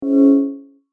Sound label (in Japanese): エラー